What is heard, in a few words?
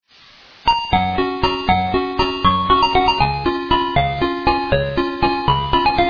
Cuckoo Waltz